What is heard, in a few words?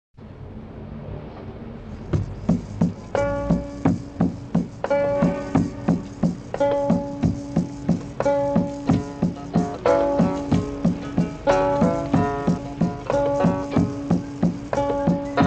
Lullaby; W 46th Street